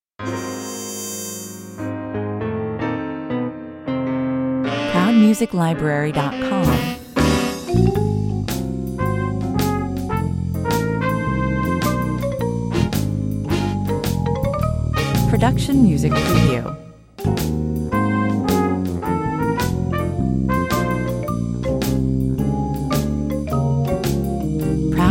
jazz dance 108 bpm

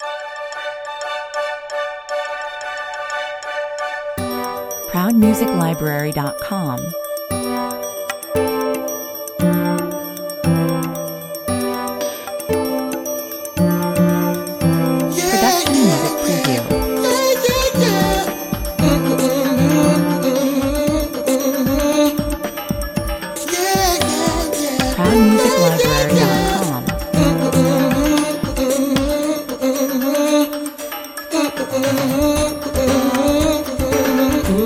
commercial

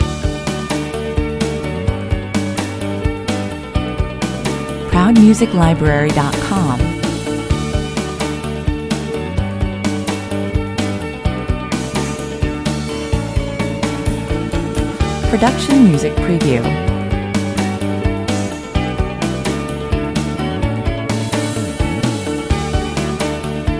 corporate loop

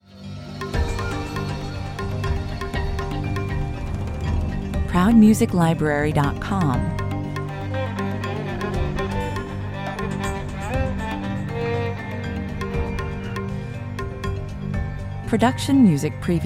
tension 120 bpm

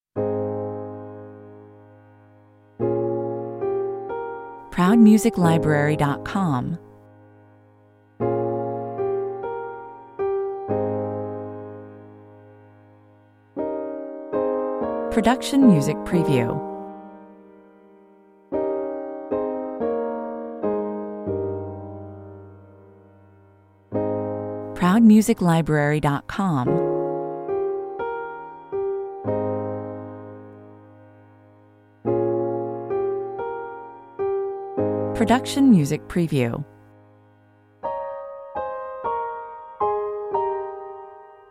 sonatas touch 112 bpm

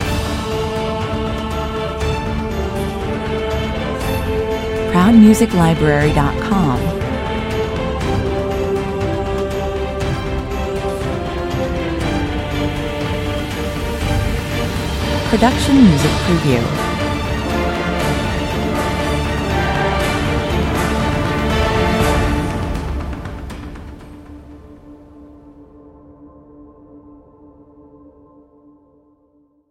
with choir

